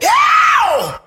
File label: ow